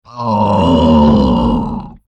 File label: pain, troll